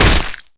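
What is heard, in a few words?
Damage Staff Bone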